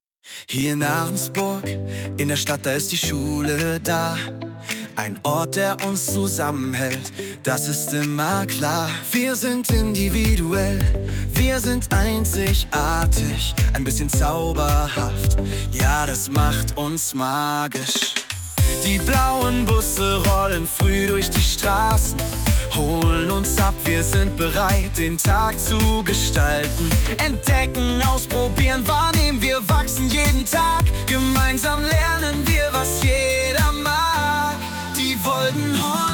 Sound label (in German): Lied